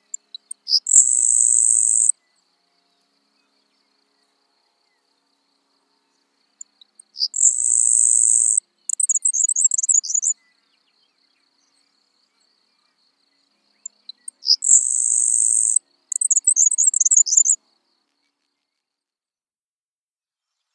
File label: Grasshopper Sparrow